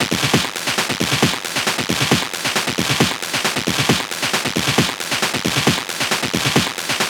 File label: Perc Loop 135, Techno